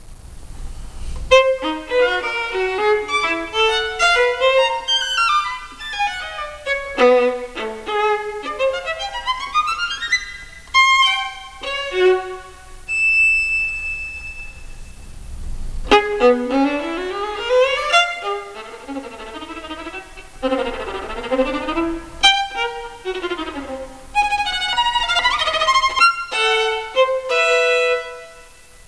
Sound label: violin